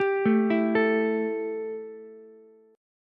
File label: E Minor Double Flat 5th, Ukulele; arpeggiated; 12,9,12,12; JGuitar